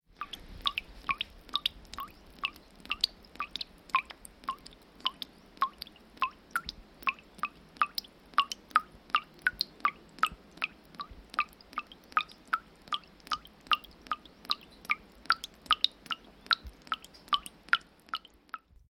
water dripping from tap into hand wash basin

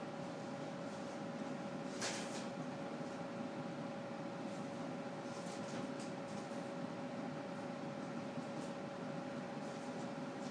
whooshing aircon sounds

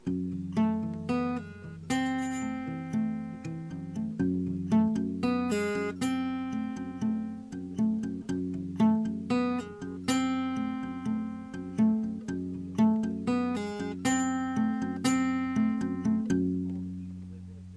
melancholic morning

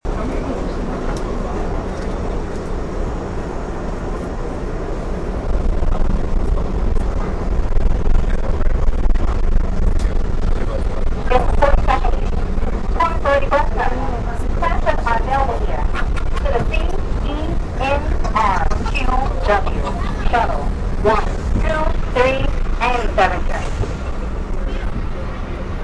ambient sound on the A train

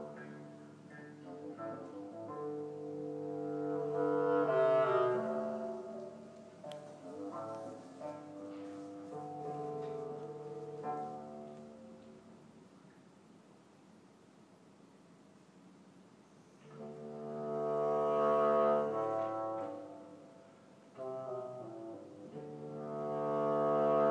play amazing invented instruments; at the boite world music cafe

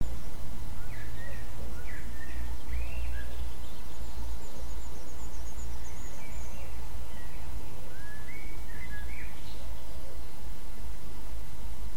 8 pm birds including owl

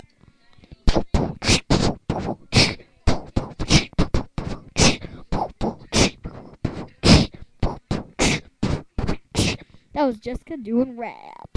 rap

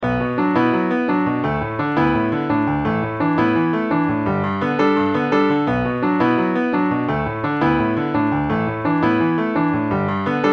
piano

